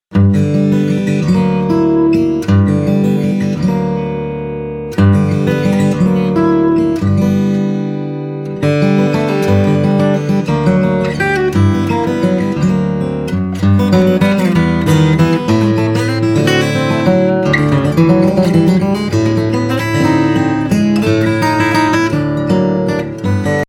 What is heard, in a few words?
French Musette